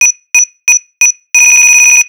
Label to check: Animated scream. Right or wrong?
Wrong